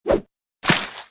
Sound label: throw creampie miss